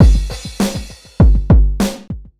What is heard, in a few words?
drloop, drumloops, Vinyl Attack; Masterbits